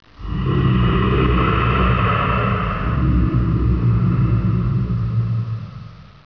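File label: ambience; svencoop; magnus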